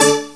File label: delete; Quicken